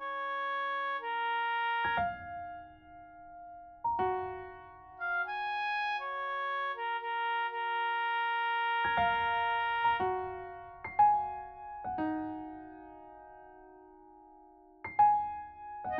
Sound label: soprano